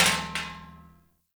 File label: Metal Trash Can Impact